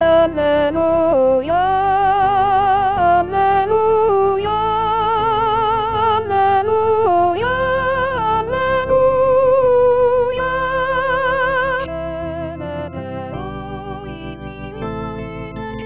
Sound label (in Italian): Cantico Dell Agnello Soprani; anno liturgico